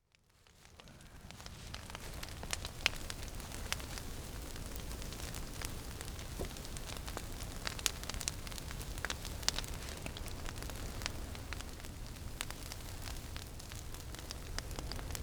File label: fire burning; anemoi